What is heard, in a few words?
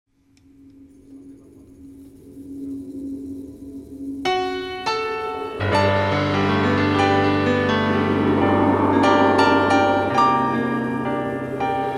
Latin Dance